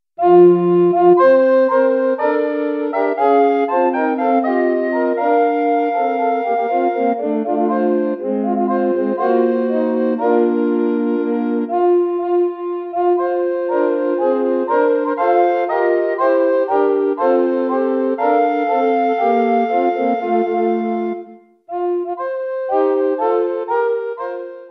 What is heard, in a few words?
Fanfare, recorder